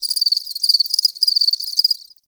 Ext Insect Close, Ambience

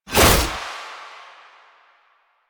Naginata Whoosh; Weapons; Melee; Warframe; Koumei Naginata

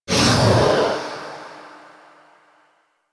Wraith Gloom Release; Warframe

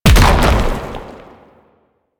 Hand Bomber Proj By Explosion; Warframe; Man In The Wall

